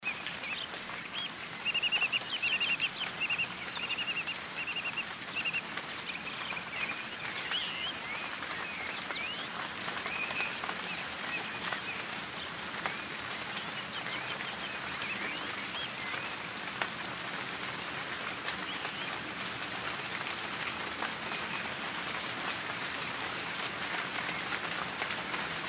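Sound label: rasslablyaush osenn dojd, relaxing autumn rain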